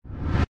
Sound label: scenario falling fireballs; Adventure Time - Break The Worm; ThrillGames